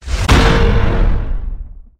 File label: feedback